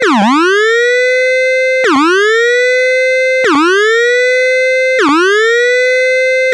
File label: RED ALERT